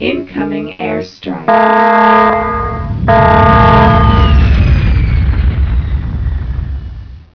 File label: airstrike; quake2